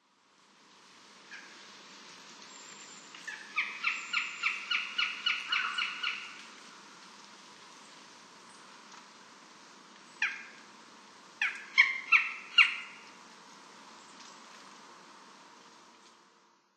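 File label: Northern Goshawk; utsunomiya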